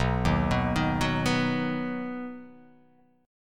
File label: B Major B down